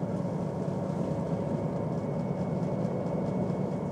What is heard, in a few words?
rocket reload turn loop